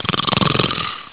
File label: snore